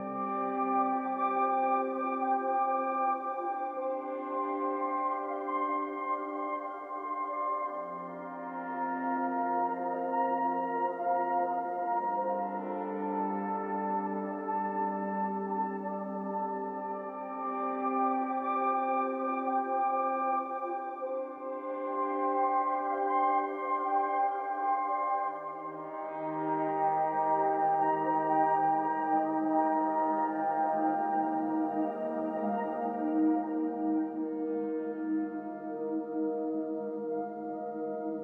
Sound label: ambient peaceful; Hollow Knight: Silksong